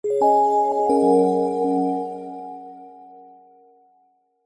multimedia game sound relaxing game soft twinkling chimes goodbye finish